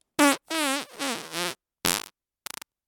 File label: fart; vegan